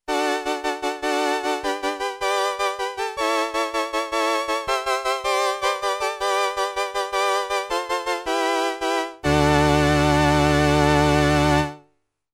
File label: STRINGS I II and vibrato